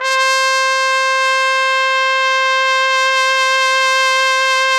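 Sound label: trumpet; Roland - LA Composer vol. 3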